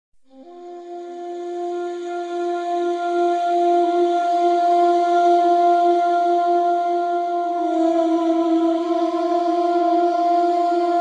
Instrumental

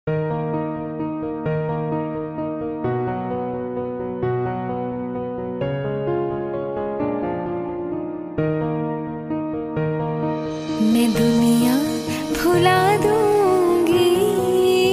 Female Cover

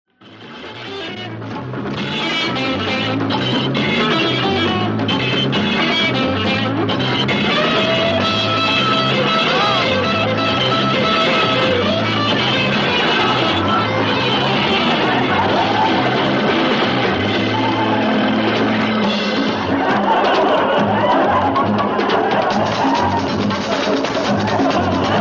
Dance Music

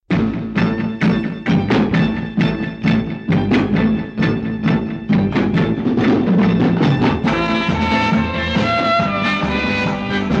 Climax Music

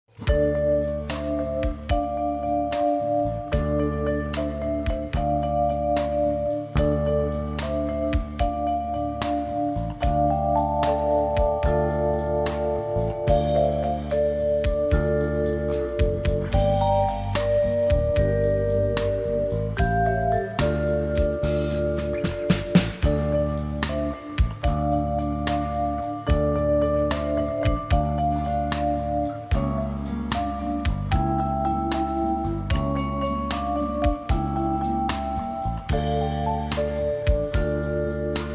Melody

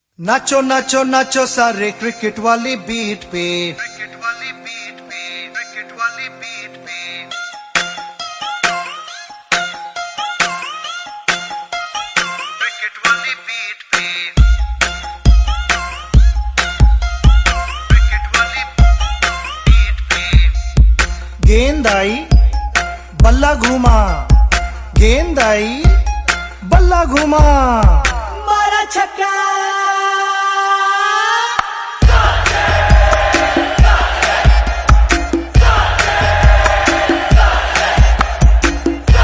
Beat; Cricket; Sachin Tendulkar

